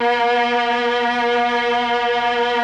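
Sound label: Mellotron strings B2; Greytsounds VOL-1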